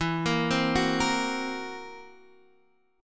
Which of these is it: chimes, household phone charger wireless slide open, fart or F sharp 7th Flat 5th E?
F sharp 7th Flat 5th E